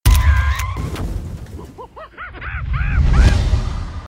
SMS

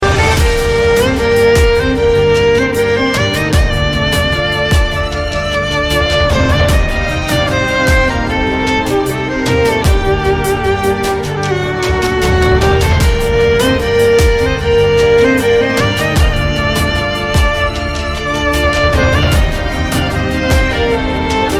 Violin Tone

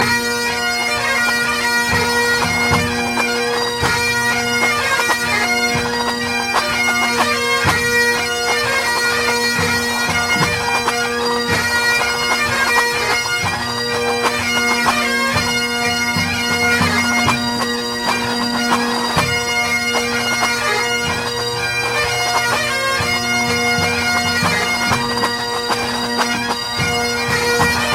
Pipe Bands

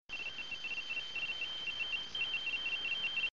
Telemetry Sound

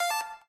wand; Star butterfly

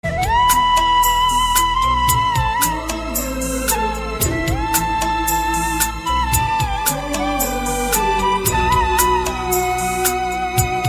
Lovable Flute

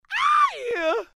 Aieh

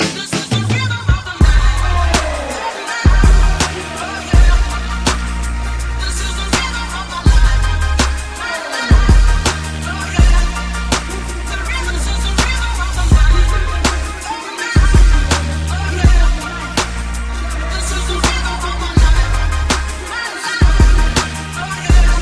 Gangsta Beat 72

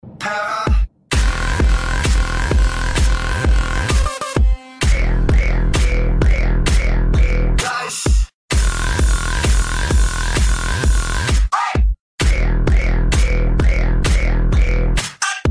Bass - High Beats

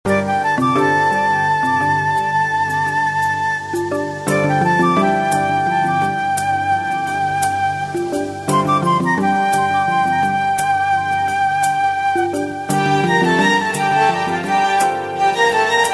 Ringer

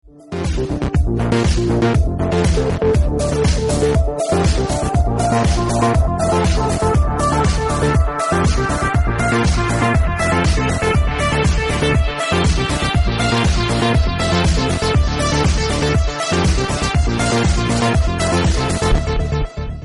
Hi-tech Beat

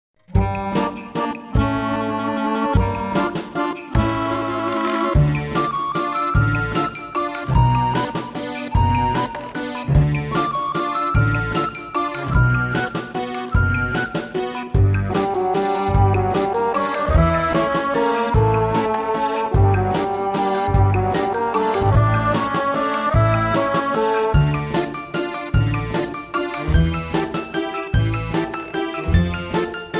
Horror; MarioParty2

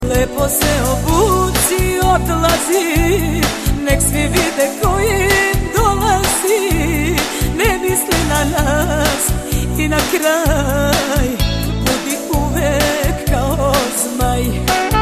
Folk; Zmaj

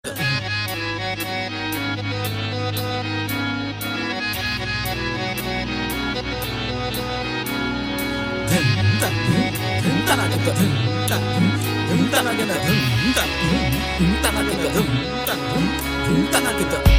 Harmonium Hindi Song

